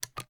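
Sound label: reload loop fast start, shotgun; reloads; iw8; romeo870; gmod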